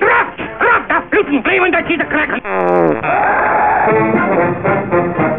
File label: dictator fart, farts